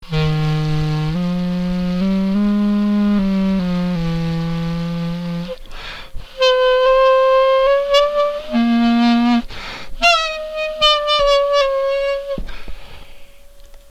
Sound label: clarinet